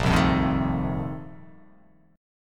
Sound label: A sharp Major 7th Suspended 2nd Suspended 4th